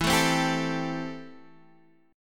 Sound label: C 6th E, chord